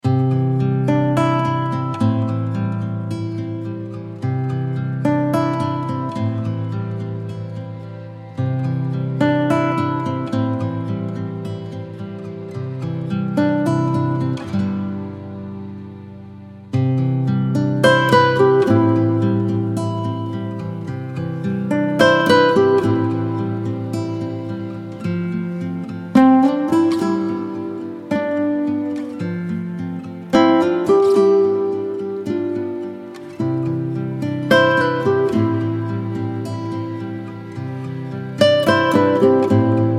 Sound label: guitar